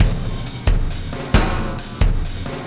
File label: Impact Crater Beat, Processed Drumkits